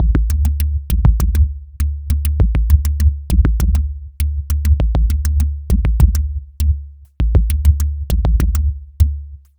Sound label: Timber Land, Ethereal Textures, Beats; Sonic Foundry (Sony Creative Software); Ma Ja Le Ethereal Textures